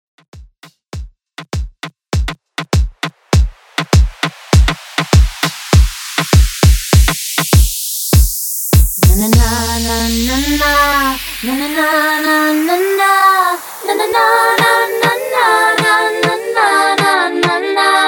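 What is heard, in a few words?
Acapella Intro 100Bpm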